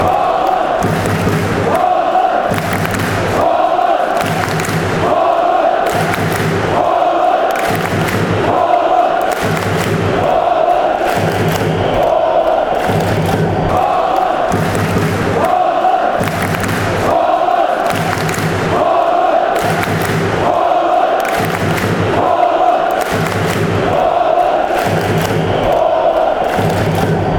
holland clap clap

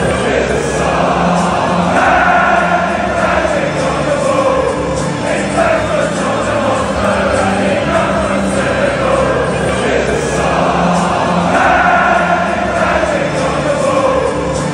player chant, fanchants; pape mate sarr; tottenham; united kingdom

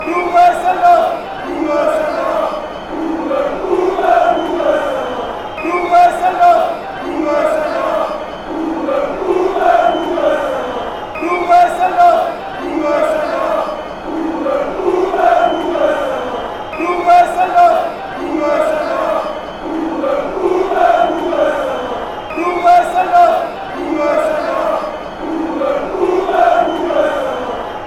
den haag chant

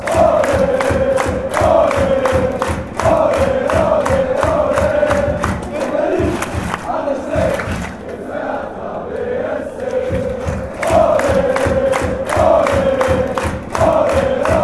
allez allez allez hertha, chants

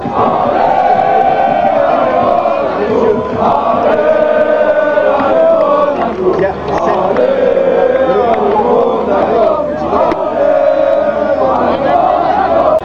allez, fan chants; monaco

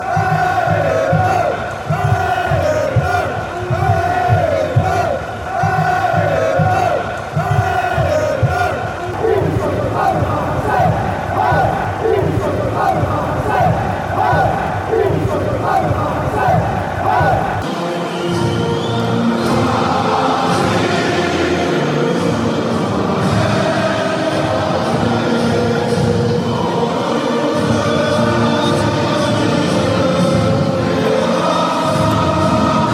world cup chants france

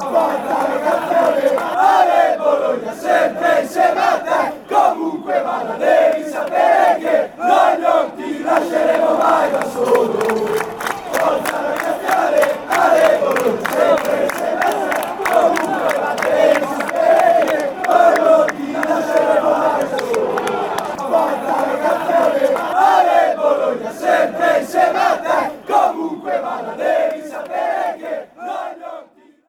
ale, fan chants; bologna

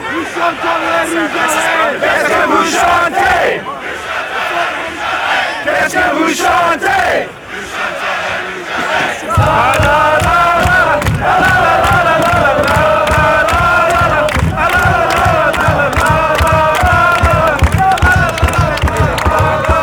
massive chant, fan chants; tfc

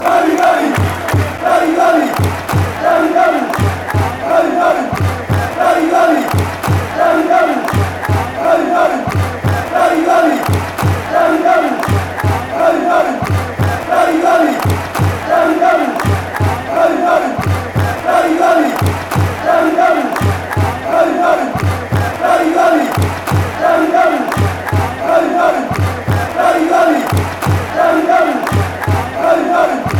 chants; dady

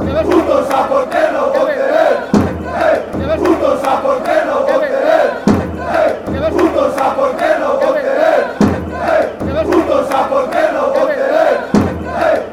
wore, fan chants

